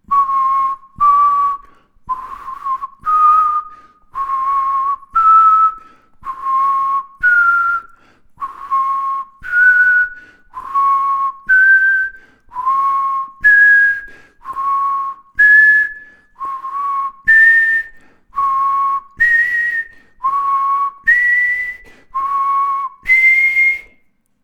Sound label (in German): Pfeifen Tonintervalle chromatisch Bathroom